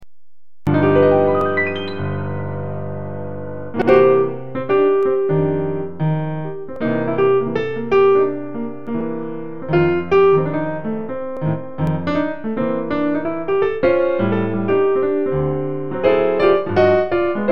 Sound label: Practice for Rhythm 16th Notes